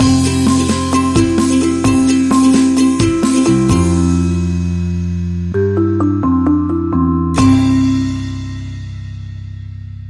Sudden Bounce, Sting Upbeat

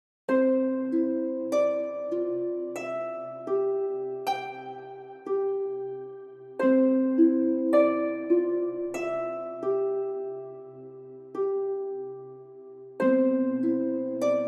Synth

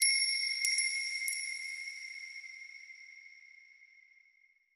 Jingle Jingle Chimes

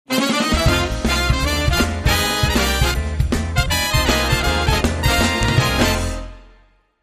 Shuffle Brass, Jingle

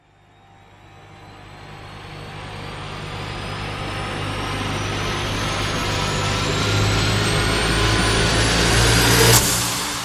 FX

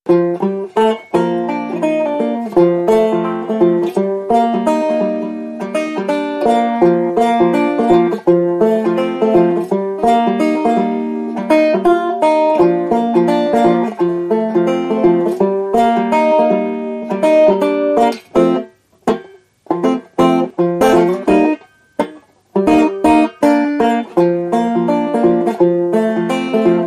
Carefree Banjo Only

